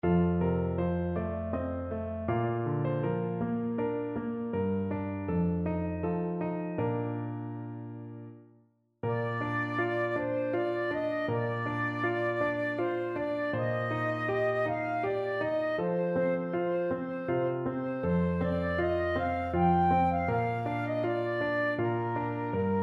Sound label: az yashir moshe flute